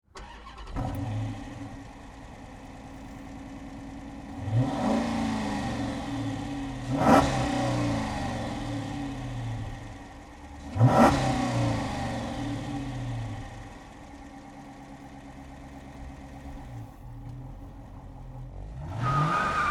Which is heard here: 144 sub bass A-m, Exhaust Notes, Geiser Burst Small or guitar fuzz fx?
Exhaust Notes